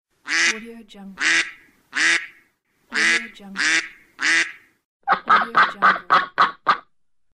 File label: duck quack